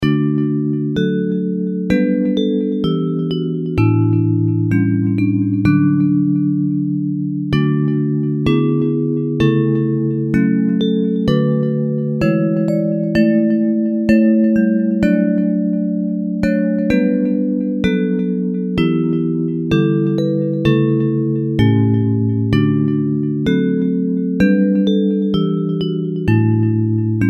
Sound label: Bells; Hymns